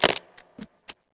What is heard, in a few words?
briefcase open; Max Payne